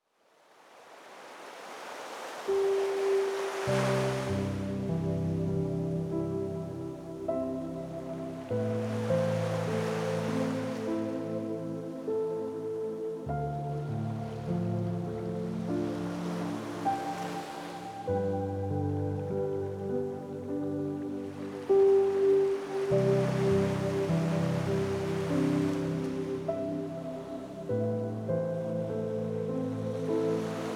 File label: Piano Waves Soothing Melodies with Serene Ocean Ambiance; AdobeStock